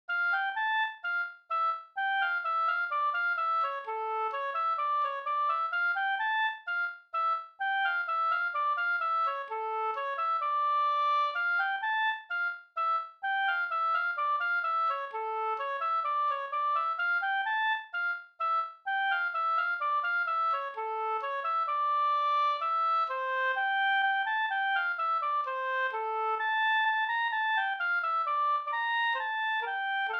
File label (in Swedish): skanklat